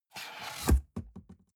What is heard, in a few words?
cardboard box open unfold bounce scrape; objekte